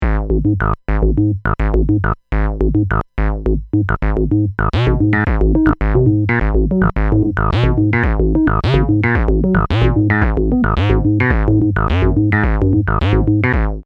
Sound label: hand sequenza